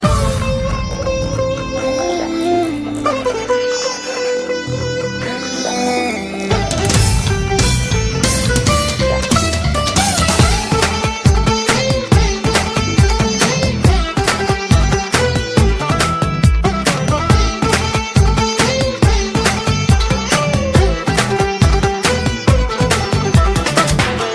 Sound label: Sitar Beat